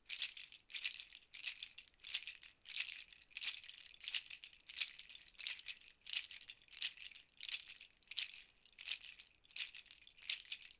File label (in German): nussrasseln kemiri